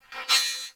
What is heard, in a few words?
Beep; Warframe; Corpus